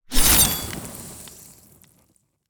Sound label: fire knife; battle